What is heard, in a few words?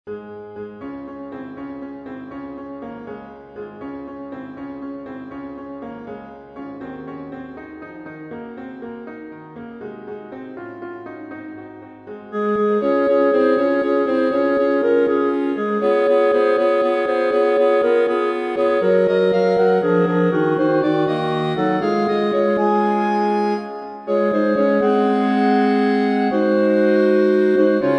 1st Choral Entrance Tutti; Were I A King In Very Truth; grandduke